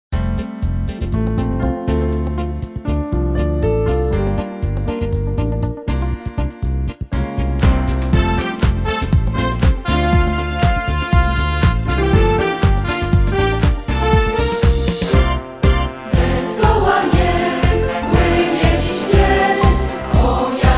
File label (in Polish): Hymn szkoly